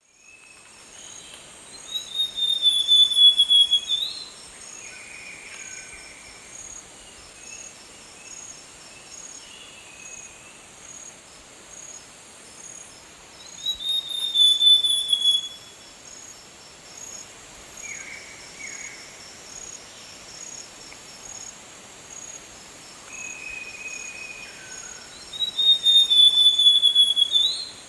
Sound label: Cyanocompsa cyanoides, song; S. America; Eastern Lowlands